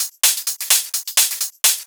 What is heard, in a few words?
Hihat Loop 128 BPM